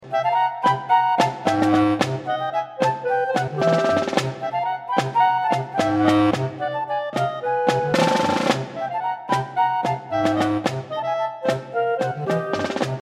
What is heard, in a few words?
theme; svencoop; sc psyko